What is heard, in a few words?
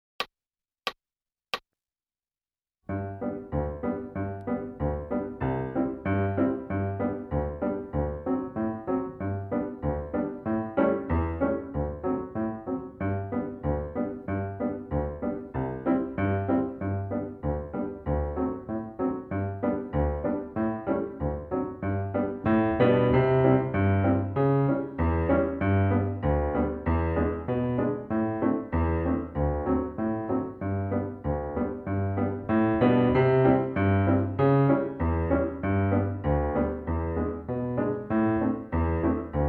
piano acc; Redwing